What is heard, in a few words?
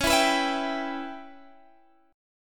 C sharp Minor 7th Flat 5th C sharp